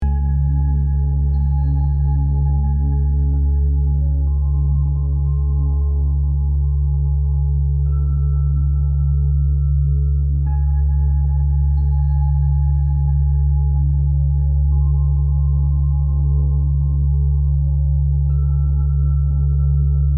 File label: healing music; cognitive function and emotional balance